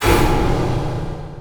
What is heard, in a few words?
shadow poison damage; weapons; dota; shadow demon